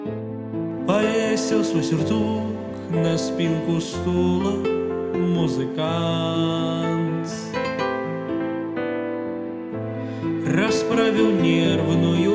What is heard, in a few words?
vocals